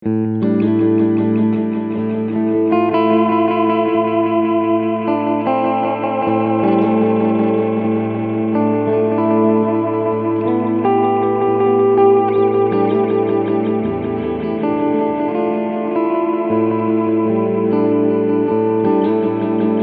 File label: Tape Head Echo II